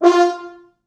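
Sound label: 4 French Horns, Horns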